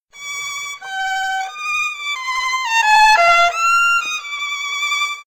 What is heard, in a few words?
smallest violins